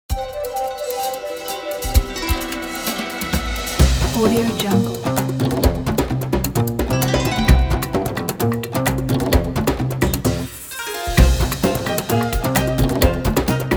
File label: chinese chops logo